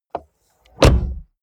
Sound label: car bmw e46 exterior door front close hard; Cars